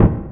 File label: hit, sfx; timber